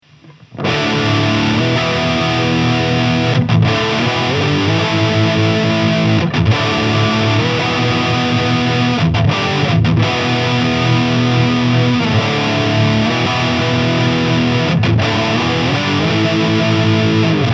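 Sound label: Epiphone 1961 Les Paul Lead, guitar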